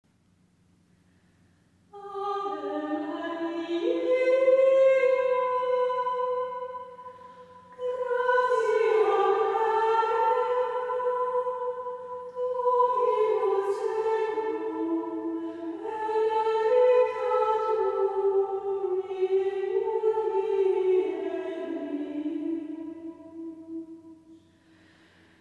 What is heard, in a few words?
church music